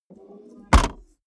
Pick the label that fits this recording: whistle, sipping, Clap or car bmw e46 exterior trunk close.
car bmw e46 exterior trunk close